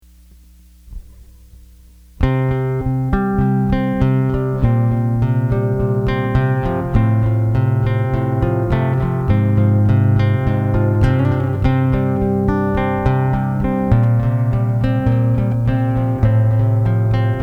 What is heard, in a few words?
acoustic instrumental medley, acoustic guitar